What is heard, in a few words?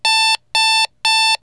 Alarm